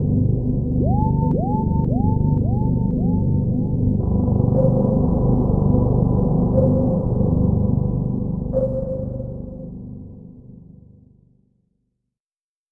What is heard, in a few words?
game over; provisional